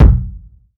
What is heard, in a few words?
reverb bass drum single shot F sharp key